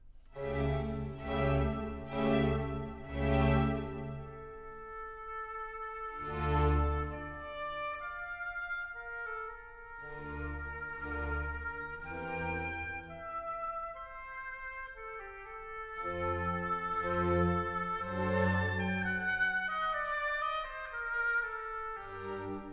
Water Music Suite No. 1 in F Major Adagio e staccato; Handel; Fireworks Music & Water Music